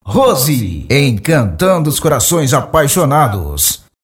vinheta

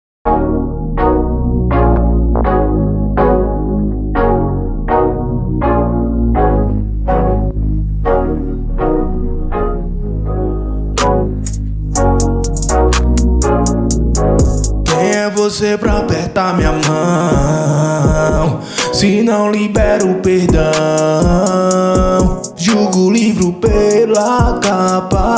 Trap Gospel

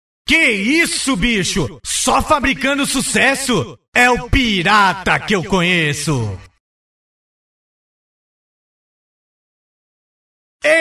acapella

